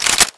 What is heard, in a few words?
bolt back, weapons mp5